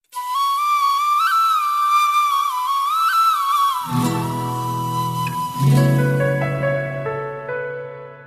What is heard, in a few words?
Message; Telenor